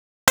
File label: GUITAR 130 BPM delay; SONIC ELEMNT; SONIC EL YES